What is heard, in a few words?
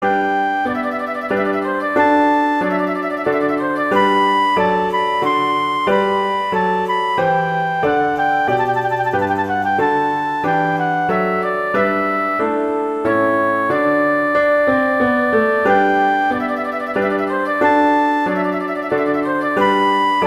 Minuet; Handel; Op1 No5